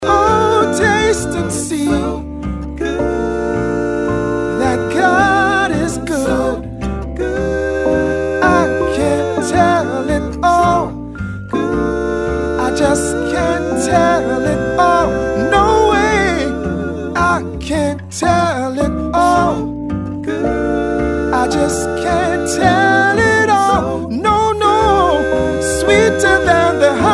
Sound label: ring tone